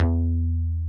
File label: BASS E2, Yamaha VP-1, SYNTHBASS; Music Store